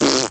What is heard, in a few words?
vintage raspberry thppp, Cartoon Sound Effects; Cartoon Sound Effects Kit